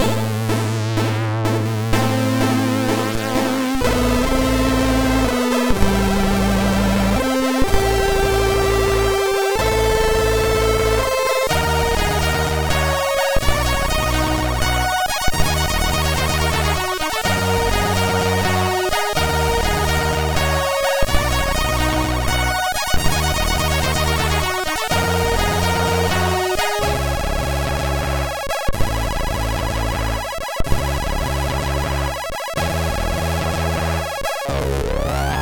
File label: Modulation; MOS6581R3